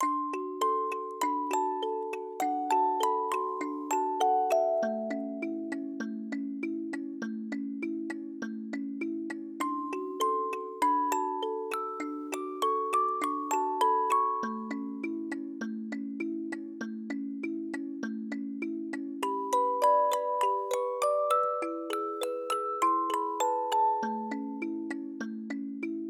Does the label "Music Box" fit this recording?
Yes